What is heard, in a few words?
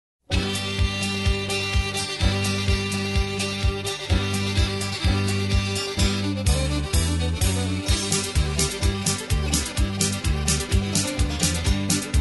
hoofin it with fiddle, Instrumental TRacks, square dance music